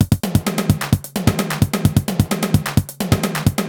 Alesis HR-16B (130BPM), beats

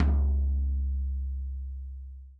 tom, Real Drum Kit, funk kit

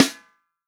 FUNK SNARE CHROMATIC, REAL DRUMS; Best Service ProSamples vol.43 - Real Drum Kits

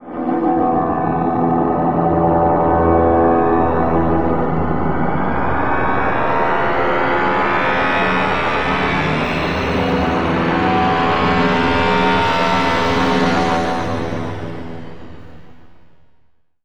Atmo FX

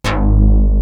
SYN SLAP, DIGI BASS; East West; Global Sounds

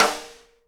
snare RIM RING, Live Snares

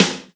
GATE SNARE

